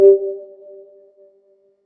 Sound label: bold beep idle